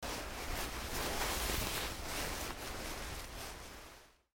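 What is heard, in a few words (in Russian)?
сооружение укрытия от дождя из брезента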